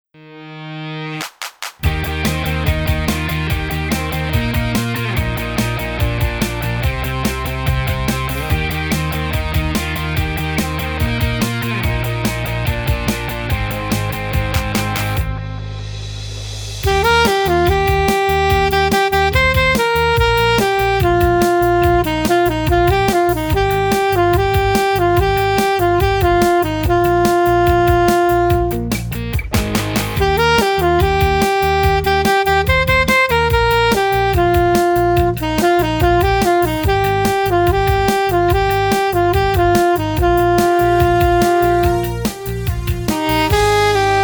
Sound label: A. Sax